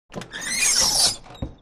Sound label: bus door; WMBonusXP